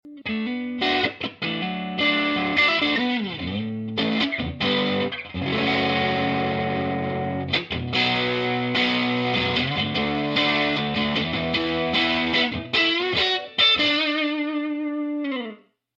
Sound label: overdriven